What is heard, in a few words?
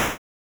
8bit snare